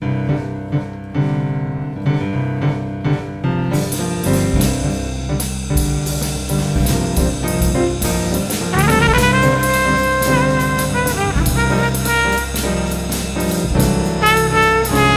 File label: QUARTET